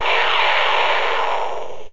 striker supershot

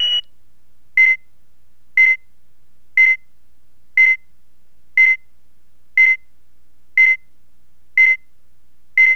10 second countdown

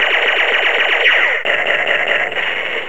sound firing deluxe 4shots 4confirms

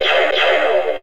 STINGER FIRE

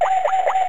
listening

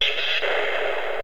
stinger hit

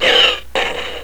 rocket firing

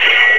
shields ricochet

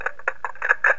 drone reload

